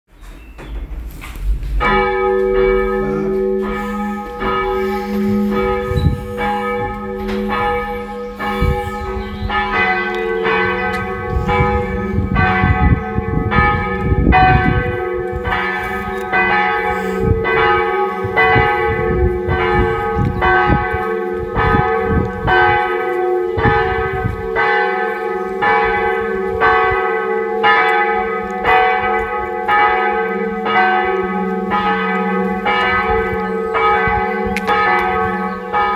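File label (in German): Glocken St. Jürgen Pfingsten; Pfingsten 2020; kg stmarien lilienthal; 2020 Corona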